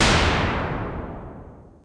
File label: AXXE1, DRUM SOUNDS, EFFECTS